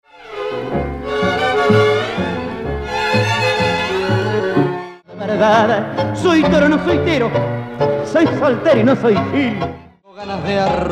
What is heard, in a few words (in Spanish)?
tango